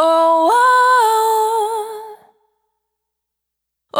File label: Urban, Single Voice Melodic Loops, Vocal Samples; Pocket Diva World Class Vocal Samples